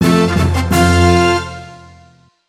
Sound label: Jingle Comp; BurgerTime Party